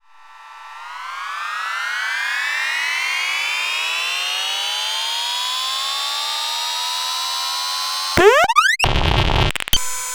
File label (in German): Soma Ornament 8 steuert MI Plaits